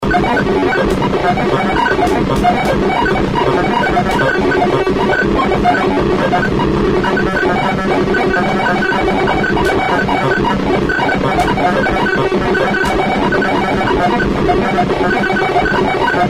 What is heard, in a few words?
Tortured Toy Noise